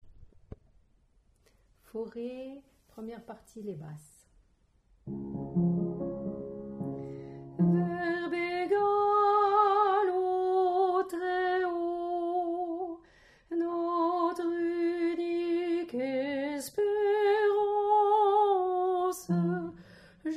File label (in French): Basse